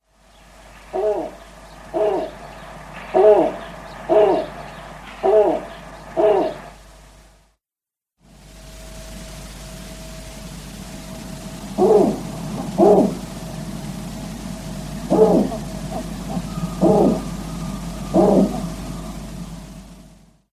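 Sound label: Snowy Owl Male territorial hoot or advertisement song, Sweden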